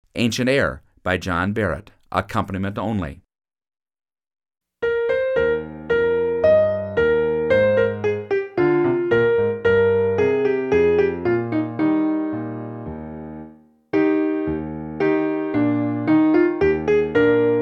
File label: Horn Only, Freshman Band; Ancient Ayres